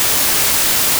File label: noise